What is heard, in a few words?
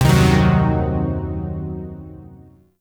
Shawzin Blues Chord D; Music; Warframe; Zariman Shawzin